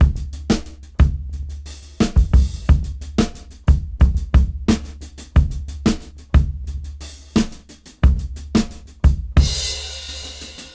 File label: Rap Dry 89 BPM; AMG - Keith LeBlanc Phat Butt Jams